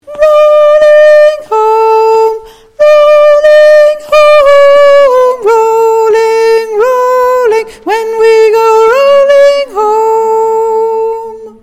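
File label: Chorus Tenor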